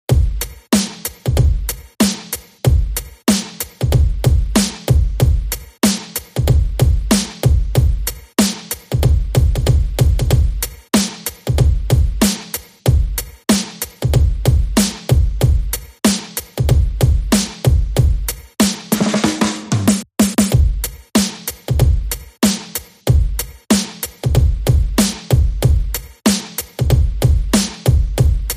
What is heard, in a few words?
Drums Only No Crashes